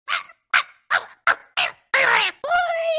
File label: Chuck Chuck Bird Sound Ringtone